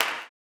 CLAP, Blues Soul; Spectrasonics Vocal Planet

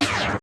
scratch; Roland MC-909

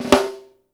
RUFF, SNARES; Sampleheads - New York City Drumworks VOL-1; SW SNARES

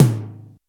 Roland U110 Rock Drums A5

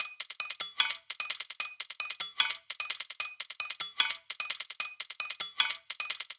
Kitchen Foley 150BPM, drums; Antidote Advent; Kitchen Foley Sounds & Loops

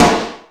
Mega Drums, E-MU Pro-Cussion; drum machine

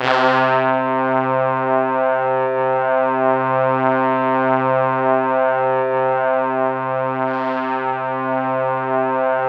C2, Synth Line; 90 s Sample CDs; NiceBeats; SynthLine VOL-24

